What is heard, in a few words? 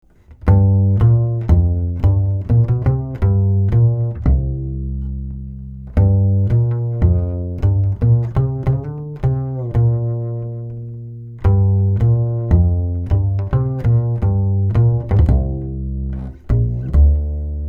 Upright Bass Blend; Rupert Neve Designs Portico 5017